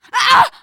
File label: hurt critical, teengirl; player; survivor; voice